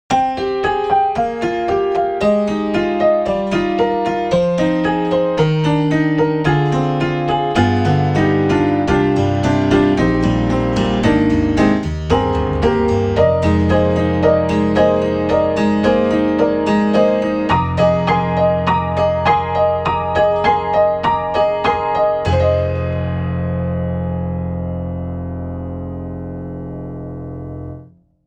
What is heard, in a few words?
Tack Piano Mono Comp; Spectrasonics; Keyscape; Wing